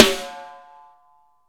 snare dry; Real Mega Drums

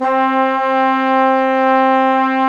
WND FGL SO, Hybrid Winds Flugel & Sop

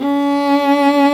STR SOLO, solo section; Roland - LA Composer vol. 1

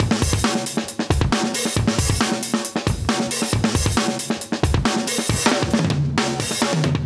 136 live breaks

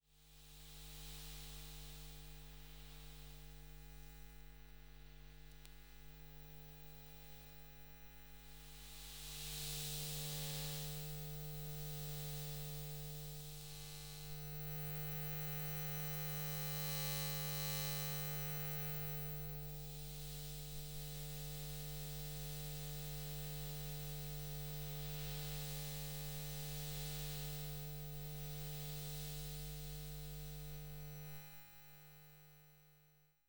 Electromagnetic Fields, Hummin; electric fields

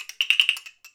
Wood, Percussive FX; musicradar; cartoon caper

